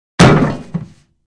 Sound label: Metal Impact Heavy Clunking; Удар деревянной ножкой от стола; Звуки удара; Звуки удара для Форсакен